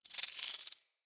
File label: Companion Collar Jingle, Foley; Pets; Zanuka